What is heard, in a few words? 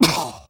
pain sharp; Engineer